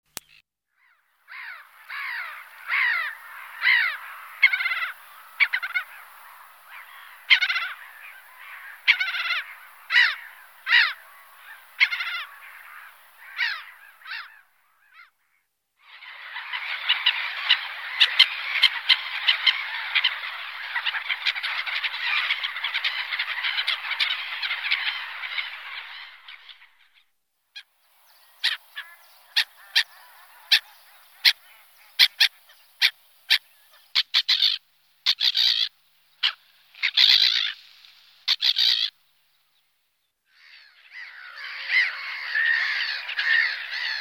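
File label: ozernaya chayka golos, black-headed gull voice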